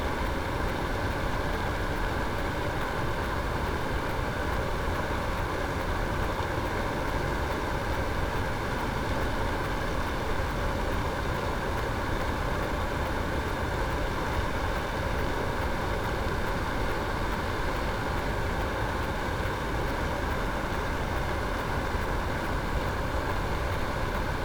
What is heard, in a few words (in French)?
cachalot negatif, Physeter Macrocephalus